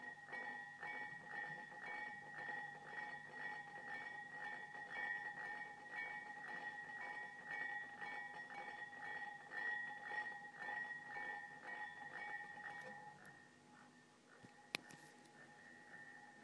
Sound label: wine glasses vibrating due to boiler